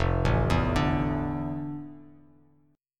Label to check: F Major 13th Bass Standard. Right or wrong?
Right